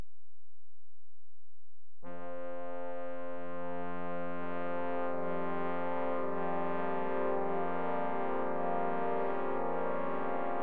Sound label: Trombone Choir; The Star-Spangled Banner for Trombone Choir